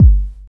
hot bass drum one shot g key, Royality free kickdrum sound tuned to the G note.